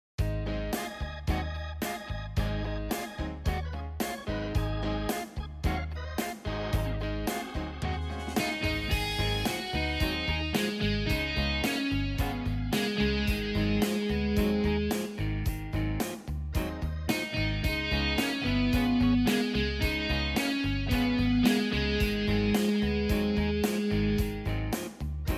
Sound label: Blues